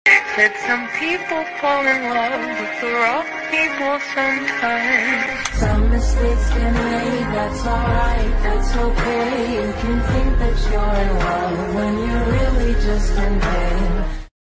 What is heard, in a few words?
attitude ringtone bgm ringtones english ringtone